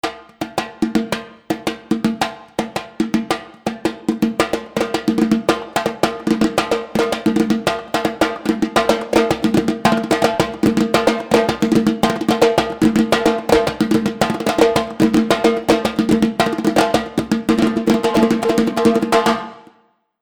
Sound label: solo